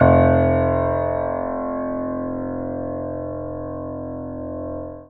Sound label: pf low